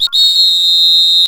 Whistle

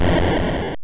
boss explode; Super Mario Land

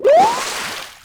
Jump Out Of Water

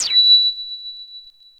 World Map Path

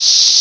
fuse lite; mario 64

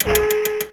Cannon

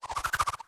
Boomerang; Link; Super Smash Bros

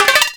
Koopaling Exit Shell; New Super Mario Bros. Wii

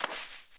Fire Bros Fire Ball Hits Water

